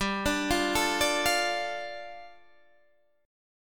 G 7th Suspended 2nd G, Guitar Open D

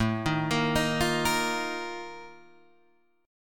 Bb Major 7th Suspended 4th, A, chord

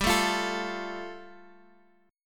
G 7th Suspended 2nd; G7sus2 strummed

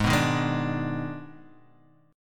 Eb Major 7th Suspended 4th Sharp 5th Ab

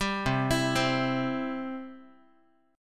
C Major, Guitar; arpeggiated; JGuitar

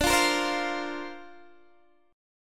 D-Minor 7th Sharp 5th, D, Guitar, Open D

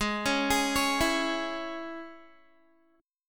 G sharp Suspended 4th Sharp 5th G sharp, Guitar; arpeggiated

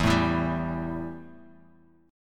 E Minor Double Flat 5th E; strummed; JGuitar

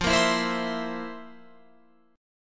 F Major 7th Suspended 4th, Guitar, Open F

